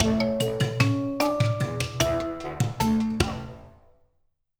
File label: Forest Slow Win